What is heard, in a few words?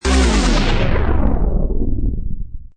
Space Scifi Sound Stop And Down, Sharp stopping; slow down sound; Also good as reactor shutdown, transformer; Lynne Music